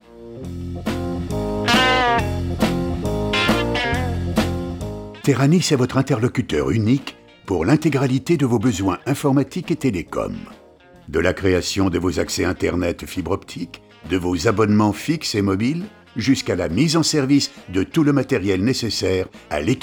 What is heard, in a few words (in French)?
Attente; Teranis